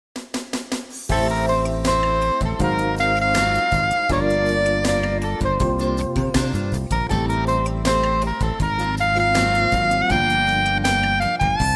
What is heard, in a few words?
How Many Ducks